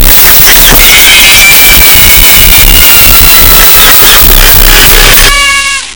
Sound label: loud laughing and scream; fnaf